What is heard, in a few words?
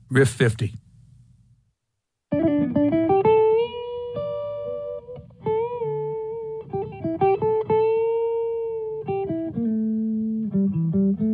Riff